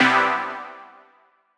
synth one shot c

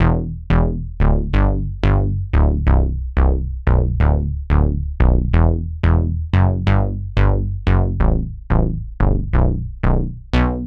90 synth bass, Em

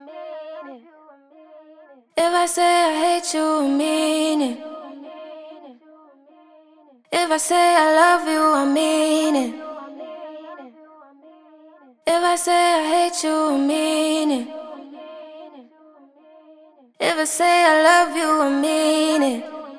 97 vocal loop mean A-m

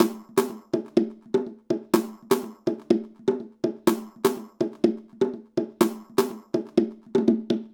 124 Perc

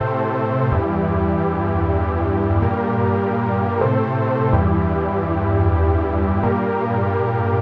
Synth Loop 126 Gm

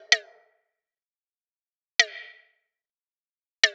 Perc 128

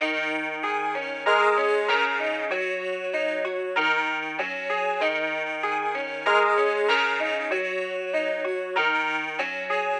Melody Loop Guitar D 96 BPM LoFi Ambient

